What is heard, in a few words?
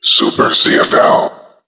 whisper low; Quik